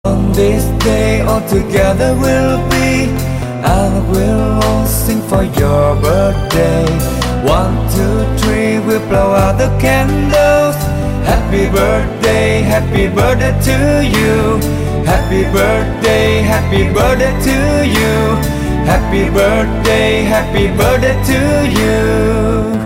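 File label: happy birthday to you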